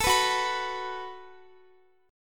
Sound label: Gb Minor Double Flat 5th, Guitar; strummed